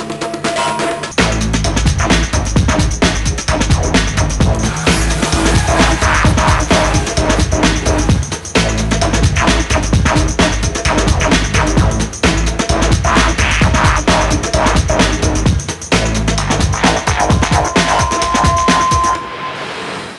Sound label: splash intro; Ripcord Games